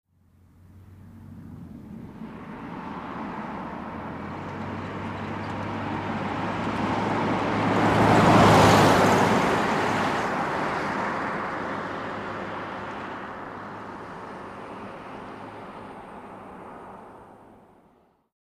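download Sound, Chevrolet Corvair, By Medium Close Up, Medium Speed.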